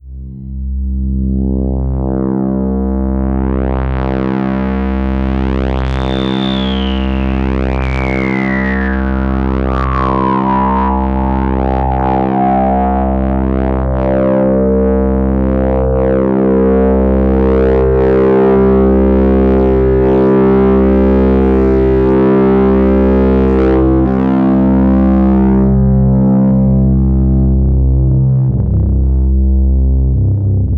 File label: res bass